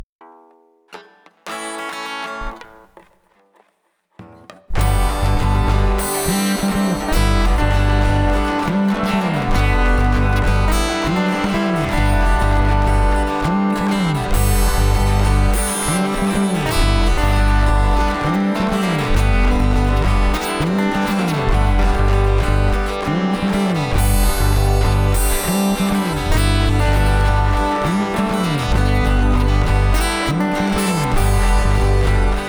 guitar; weeklybeats 2024

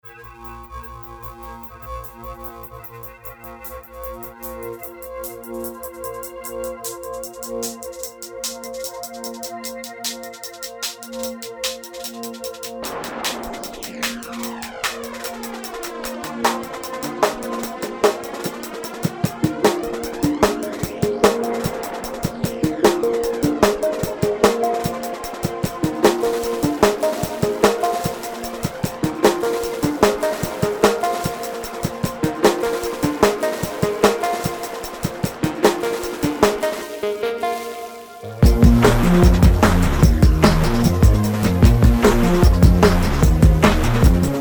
bedroom beat; weeklybeats-2020

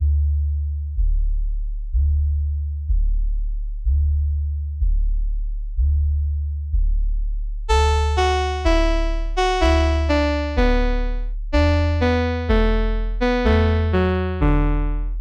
heart arp

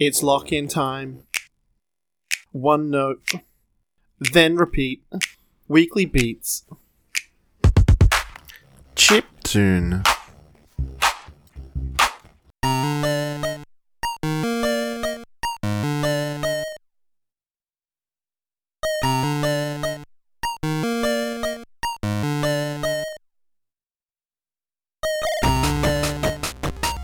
chiptune blues; weeklybeats 2026

